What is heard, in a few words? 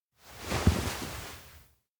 sidecar load soft; jet; anemoi パッケージ版 1.1